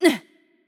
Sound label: Hurt Light; bloom minigame